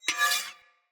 Sphere Sword Whoosh Accent

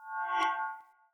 Great Sword Accent; Weapons; Swords; Dendrit Ara Great Sword; Accents

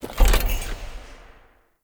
UI Camel Vendor Close

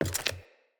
wood step; Characters; Ambient; Sprout Farmers

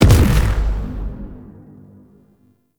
Railjack EMP Stun Ability; Warframe

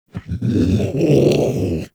predator vocal captured idle; Warframe; Hunting Critters; Characters

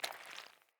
Grunt Boot Walk Water, Footsteps, Boots; Enemy; Sinecure; Melee Grunt

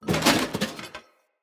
Corrupted Battle Dog Foley Jump

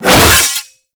Drill Scythe Whoosh; Warframe; Grn Drill Scythe

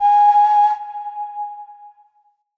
Flute G Sharp; Player Instrument

